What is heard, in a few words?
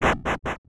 steps